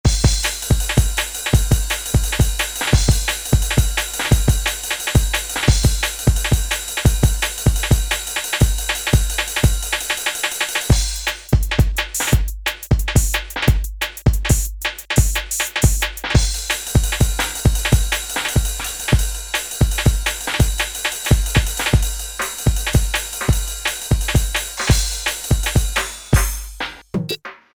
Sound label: Jungle Kit, Alesis Z2 EuroDance